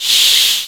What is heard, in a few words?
Crowd Cheer; SNES; Super RBI Baseball